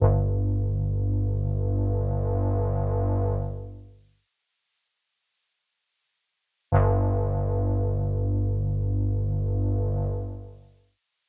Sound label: 143 Gm synth bass